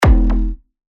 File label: percussion F; revealed percussion vol 1